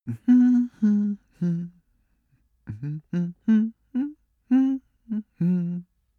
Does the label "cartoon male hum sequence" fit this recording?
Yes